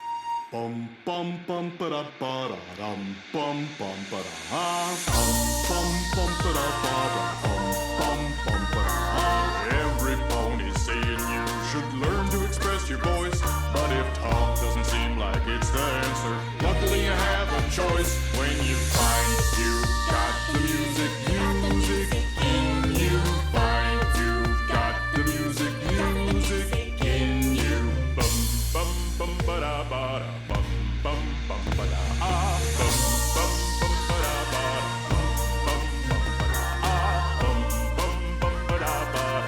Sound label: Orchestral Remix